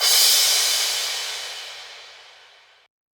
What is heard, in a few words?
Cymbal, PsyTrance